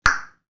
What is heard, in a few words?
tongue clap